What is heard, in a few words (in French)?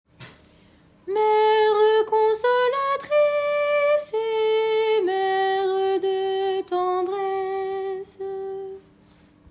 Chant du Jubile Couplet Soprane; sanctuaire